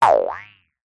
cartoon boing squirrely jaw harp; zapsplat

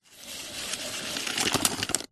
industrial tape measure retract slow close up

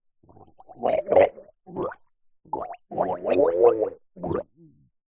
horror male chock gag gargle in own blood

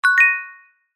multimedia notification bell glassy chime

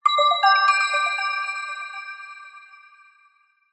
sound design twinkle mysterious warm musical; zapsplat

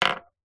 jewelry ring heavy set down wood surface; household

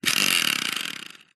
rc car wheel force spin by hand clicks

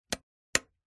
household radiator heater portable switch click

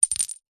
glass small disc set down concrete

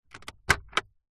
vehicles car glove box open toyota rav4; zapsplat

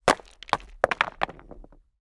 impact rock large rockpool dry

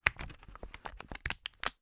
mask plastic halloween movement

